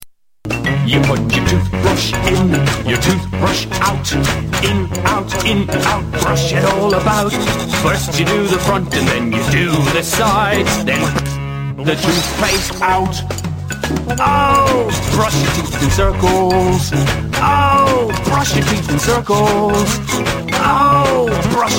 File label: Toothbrush Song, children songs